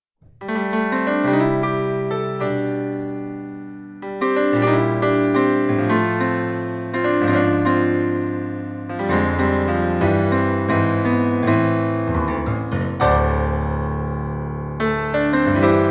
Kronos Bright

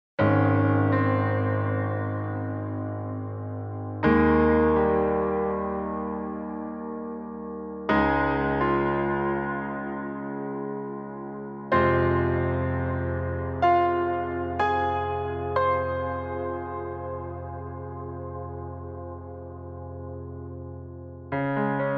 Montage Worship Small

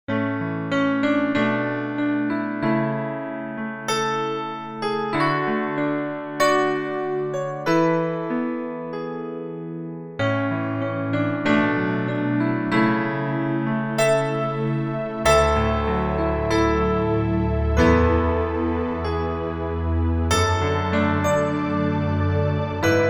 EG Montage Ballad

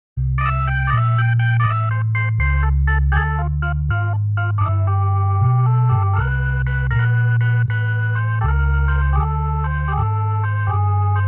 Kronos OASYS Jazz; Organimation

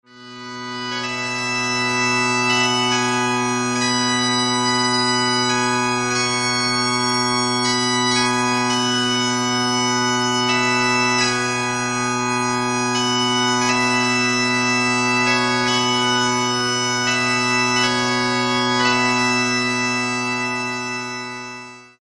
Solo Bagpipes

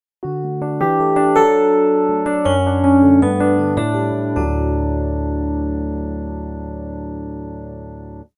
DXish Rhodes

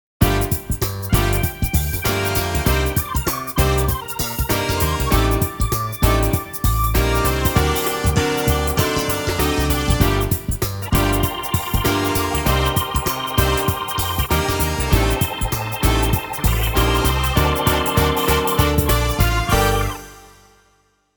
Gospel Mix; Organimation; M50

